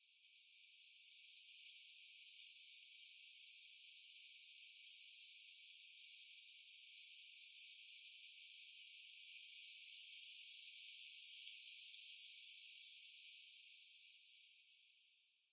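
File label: Willow Tit; koe; kogara; shinoyama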